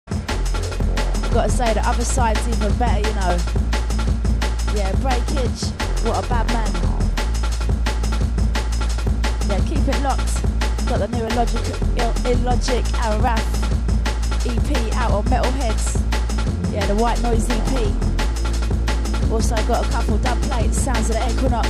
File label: Drum and Bass Jungle and Old Skool, UK Bass Radio